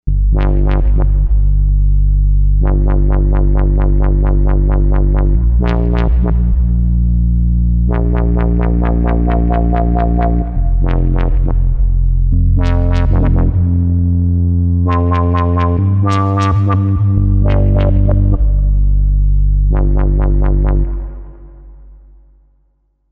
Oddly Wobbly, Novation UltraNova; Factory Patches

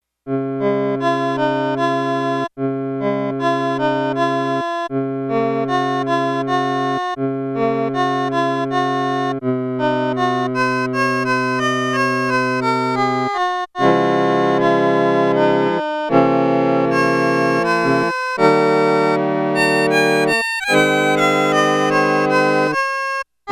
Reed Organ

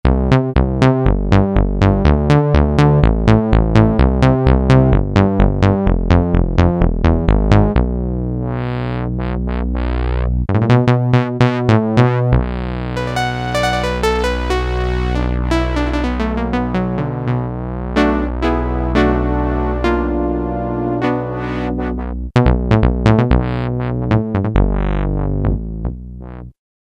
Saw, Peavey DPM Spectrum Bass

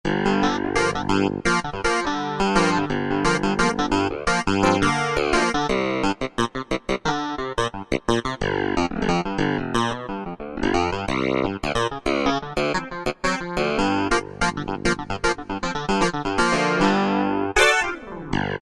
Clavinet, Korg T3

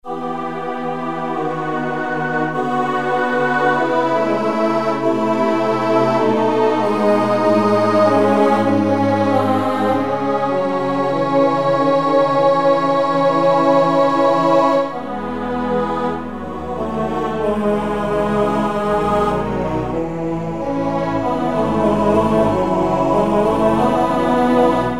Choir-Pad, Generalmusic pRP8; Factory demos

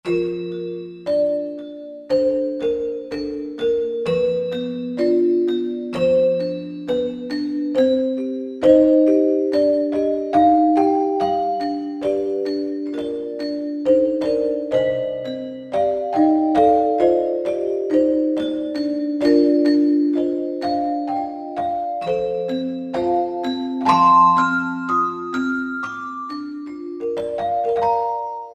Celesta, Roland SR-JV80-02 Orchestral

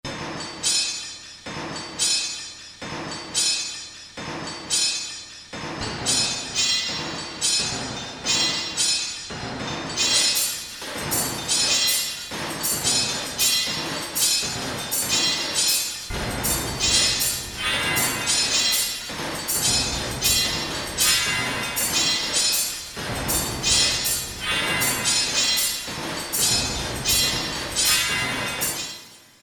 Industry, Korg DSS-1

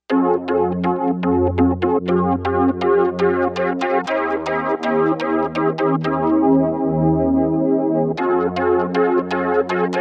Rave Pad

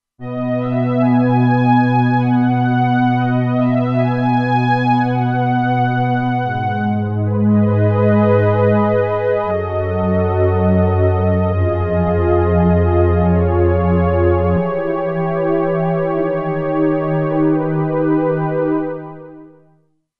Juno String, Roland Alpha Juno-2; Original Sound Chart patches

